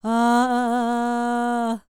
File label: TURKISH, Vocal World Voices NEAR EAST; ILIO; Vocal Planet VOL-5